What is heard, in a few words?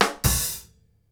KICK SNARE, Live Snares